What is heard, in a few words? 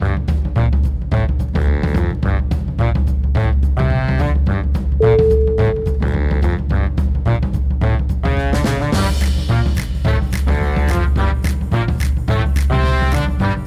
dancing at a jazz club